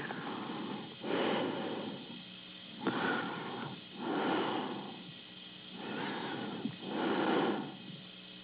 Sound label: breathing